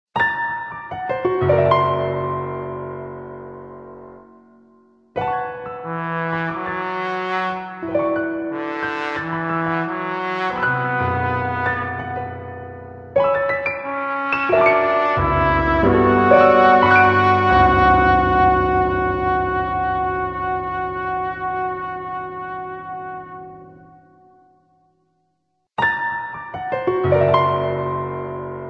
trom piano; Project Yume 2kki; Yume Nikki

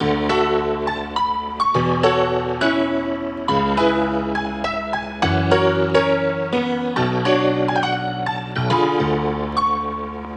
Player Piano

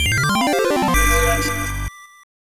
LOGO, msx; MuleNgine

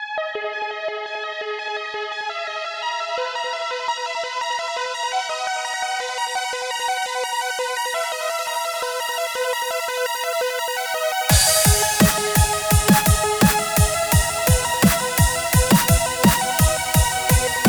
guitar; I Wanna Be The ArchWk

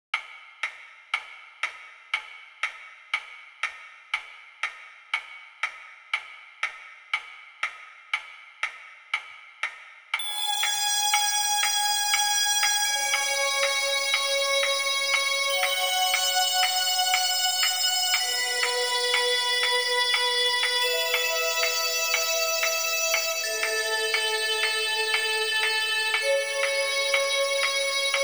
Instrumental; Touhou

